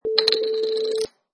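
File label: Plastic Bottle Cap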